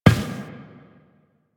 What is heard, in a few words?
Step Glass, Footsteps, Walk; Warframe; Tenno